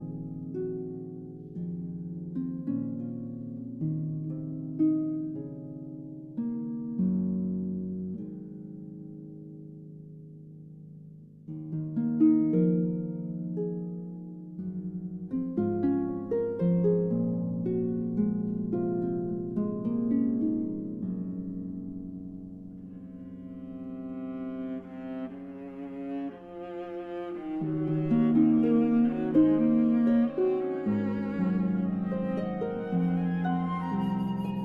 Harp Concerto Lullaby

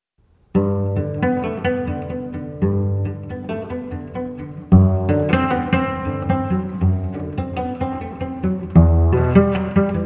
Variation for Cello Solo; Bach, Johann Sebastian; Goldberg Variations

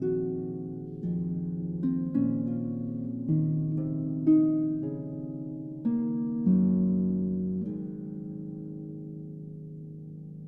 Harp Concerto Lullaby

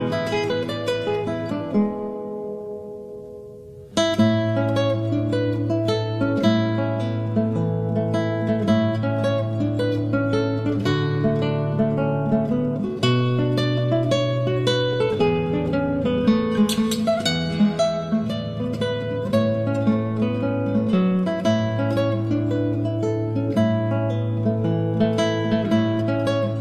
Jingle Bells

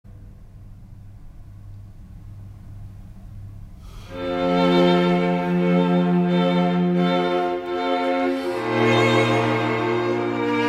String Quintet in C Major; Mozart, Wolfgang Amadeus; K.515- Andante

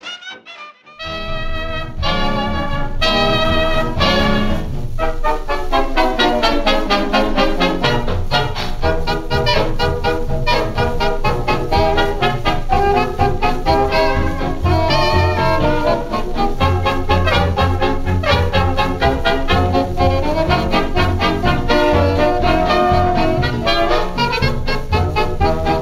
Orchestra; 1934

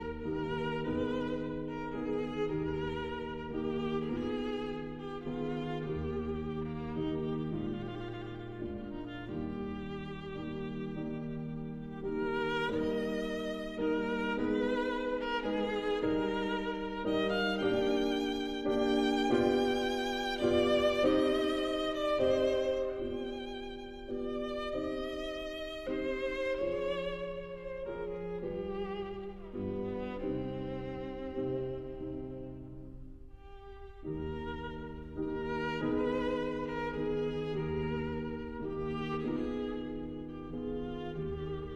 Sicilienne for violin and piano

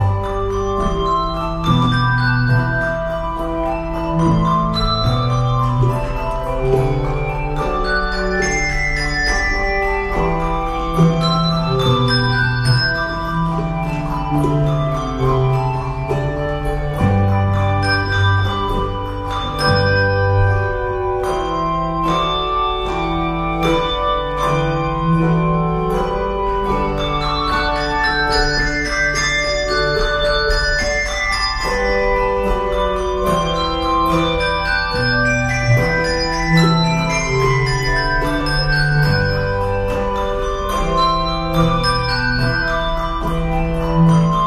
Bell Choir; Jesu, Joy of Man's Desiring (Cantata BWV 147); Christmas

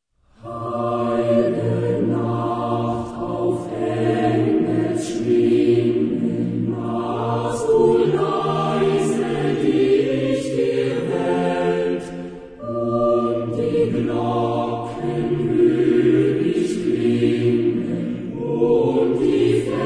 Choir; Heil'ge Nacht, auf Engelsschwingen

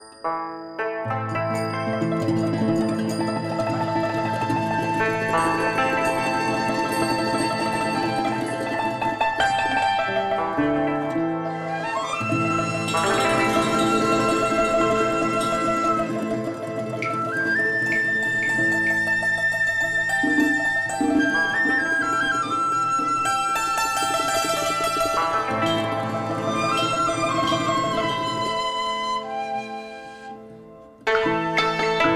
TRADITIONAL Chinese, Picking Flowers

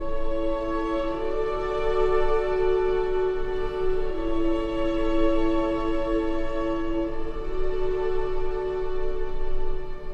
for cello and strings; Archi di Santa Cecilia

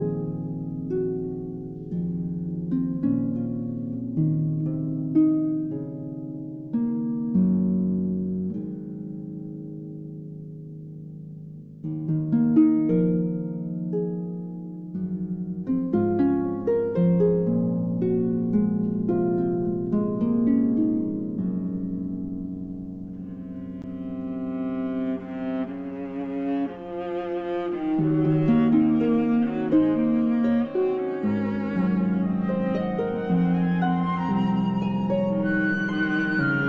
Harp Concerto Lullaby

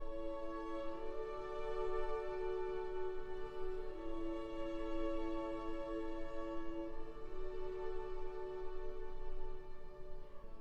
for cello and strings

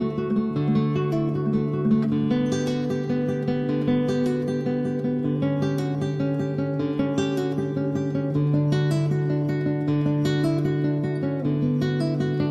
Cello Suite No. 1- Prelude

